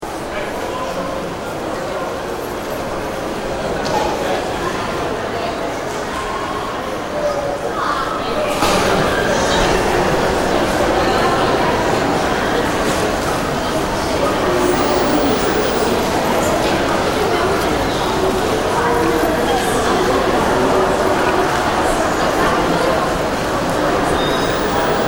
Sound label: Shopping Mall, Sound of shoppers passing through a mall.; Stores and Malls; Backgrounds